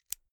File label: insert xlr extension latch hard quick; objekte; elektronik